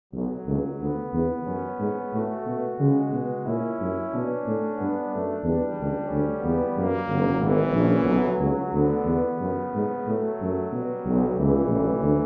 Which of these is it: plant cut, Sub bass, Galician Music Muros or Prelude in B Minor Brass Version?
Prelude in B Minor Brass Version